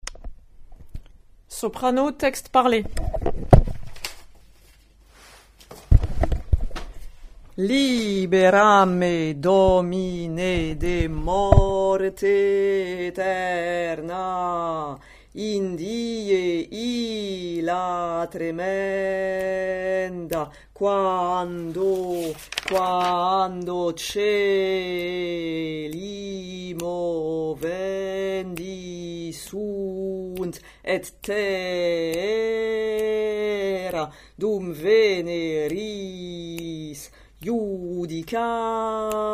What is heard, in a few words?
soprano, text; Texte parlé